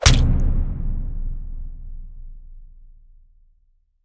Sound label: Killing; Among Us